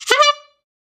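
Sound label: Bicycle Horn; SoundsCrate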